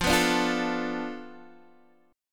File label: F 6th Flat 5th F down Guitar DADGAD, chord